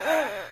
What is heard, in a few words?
female death gasp